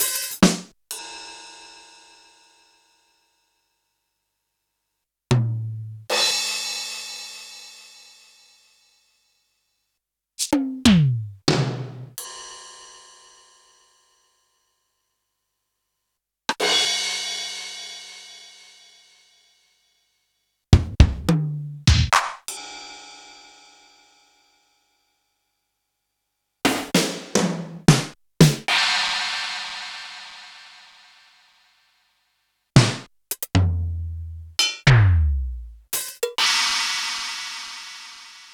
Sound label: Roland U110